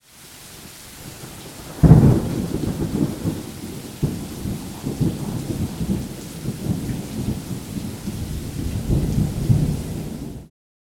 Thunder, Nature; HapticSamples; Feel; NiceVibrations

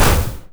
Car Crash, Impacts; HapticSamples; Feel; NiceVibrations

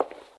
Footstep On Concrete; Animancer; Footstep Events

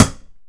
Hit; Feel; Feel Demos; Barbarians

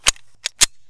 Pistol Load; HapticSamples; Weapons; Feel; NiceVibrations

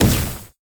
explosion gas; Epic Toon FX

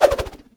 Attack; Feel; Feel Demos; Barbarians

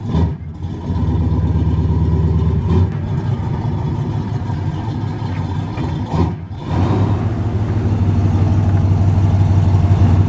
Car Engine; HapticSamples; SoundFX; Feel; NiceVibrations

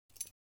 Keys; Feel; NiceVibrations

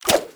Bow Release; HapticSamples; Weapons; Feel; NiceVibrations

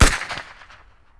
Distance Gun Shot; HapticSamples; Weapons; Feel; NiceVibrations

